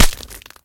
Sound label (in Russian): stremitelnij lovkij udar machete